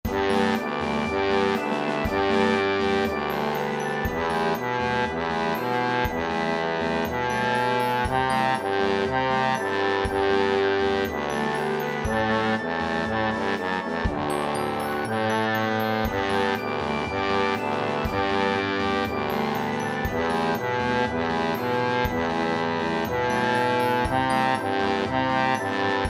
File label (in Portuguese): trombone baixo c